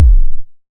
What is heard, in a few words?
Vector Kick; Cwejman S1; DevSnd Cwejman S1 - JK